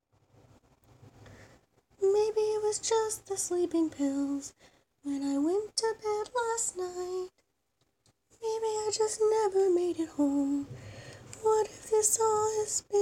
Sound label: cover, singing; not perfect. at. all.